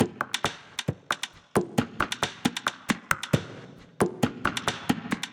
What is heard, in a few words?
Inside a Piano Kit - 135 bpm, drums; MIDI Clips; Ableton; Factory Packs; Mood Reel